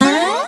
Folder Opened; Voiceover Sounds; Random Sound Pack

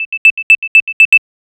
beats sound; iOS 26 Sounds

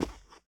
carpet step; Golden Crayon Sounds

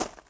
gravel step; Golden Crayon Sounds

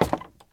wood step; Golden Crayon Sounds

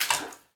sewer step; Golden Crayon Sounds

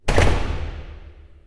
door close; Golden Crayon Sounds

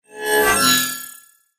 invisibility stop; Original TK Sounds

